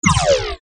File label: laser gun shot